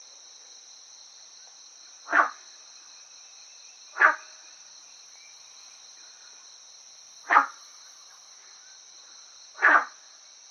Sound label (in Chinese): 貢德氏赤蛙